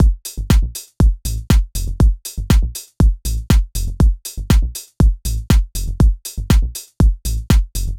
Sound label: drumloop